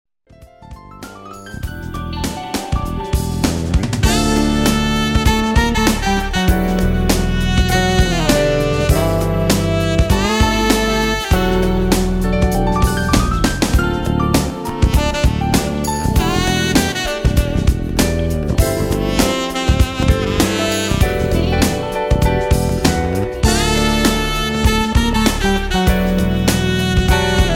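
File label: Tenor Sample, saxophone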